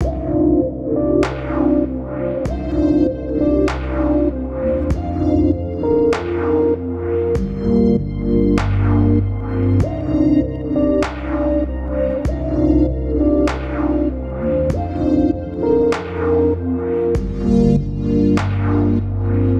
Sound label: menu screen 98bpm